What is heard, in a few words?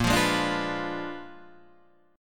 Bb 7th Flat 9th Bb, chord; Bb7b9; strummed; JGuitar